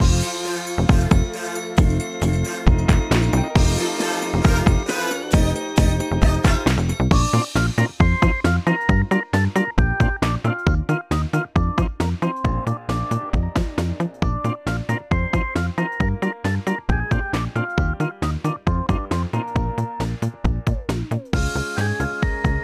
vocalish; chibi robo photo finder

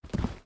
SFX Footstep Wood; this is the zodiac speaking 2020 gamerip switch ps4 xbox one pc macos

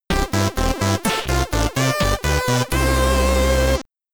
Stage Clear! (SID Stereo); Eskimo Games; commodore 64

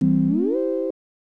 Press Button Super Scope

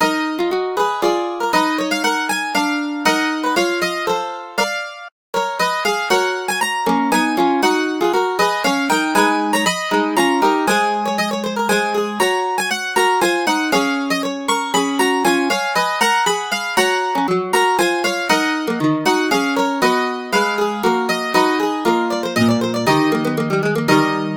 Mandolin; Wayland's Watch; The Bard's Tale Remastered Trilogy

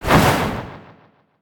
grab windup; Fight Knight

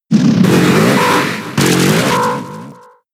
Snore; pokemon sfx gen 6 attack moves xy oras

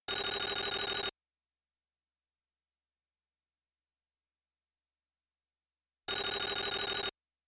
Beep; Clock Tower 20th Anniversary Sound Collection

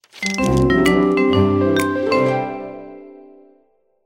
Duel Victory

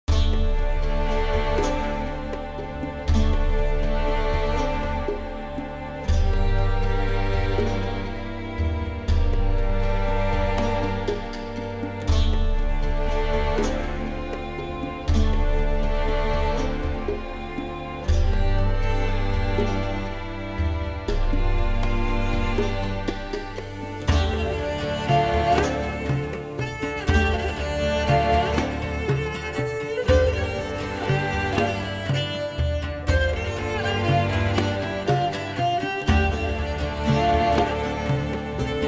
Narrative Calm Arranged All

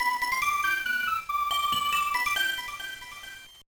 Jingle, GBA; Wagamama Fairy Mirumo de Pon 8-nin no Toki no Yousei

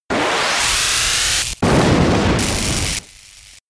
Shock Wave

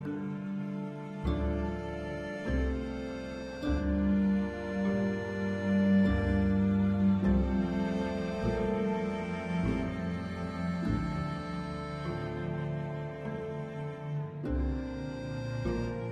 loop Sad

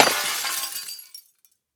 Crash Objects Glass; gamerip; Car Simulator 2; android

